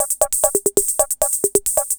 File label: CR68 LOOP; Crypton - DrumBase5000; MSX KIT